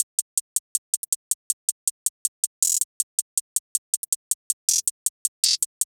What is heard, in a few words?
Hihat Loop 160 BPM; Rebel; Cymatics; Fiji Hihat Loops & Percussion